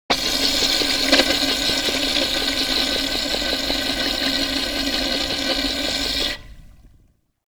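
violin A4 fortissimo power residual normalized, Residual component normalized to -16dB RMS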